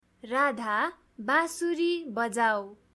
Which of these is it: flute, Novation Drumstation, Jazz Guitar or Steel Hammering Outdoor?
flute